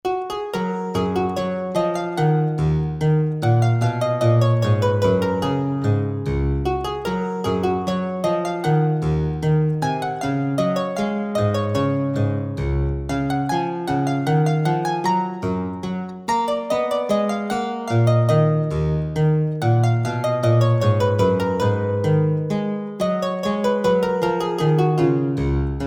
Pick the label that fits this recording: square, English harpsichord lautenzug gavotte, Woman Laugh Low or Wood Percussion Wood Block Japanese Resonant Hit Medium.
English harpsichord lautenzug gavotte